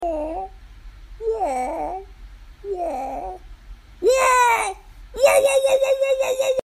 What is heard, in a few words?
crash